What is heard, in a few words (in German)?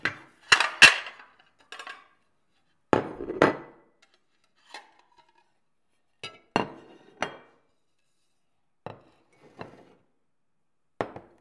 Teller klappern